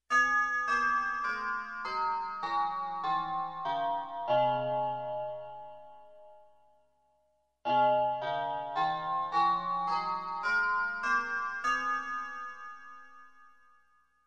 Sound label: Bells scale down and up